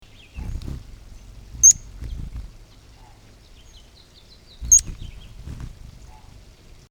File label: Blue throated Hummingbird calls and wing sounds